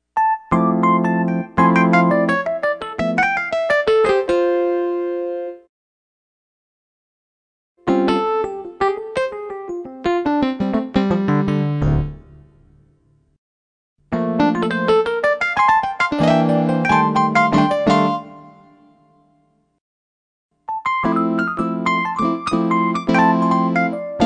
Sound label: Jazz Blues Solo Riffs